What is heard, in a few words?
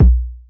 kick, drums single hits; iElectribe Kicks